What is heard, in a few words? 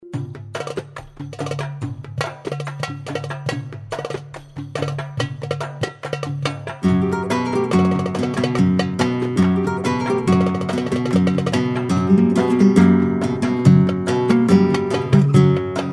danza mora, gitarre flamenco